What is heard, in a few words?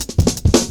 Jazz Shuffle Cut, Drum Loops; Zero-G Jungle Warfare 1, 2 & 3; 90 s Sample CDs